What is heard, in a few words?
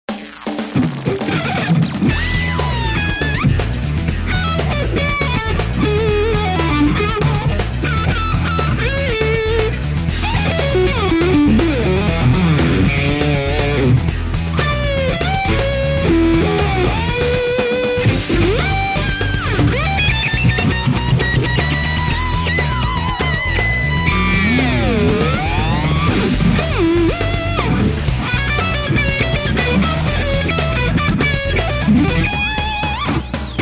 backing track lead guitar